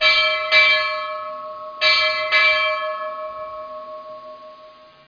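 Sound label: ship bell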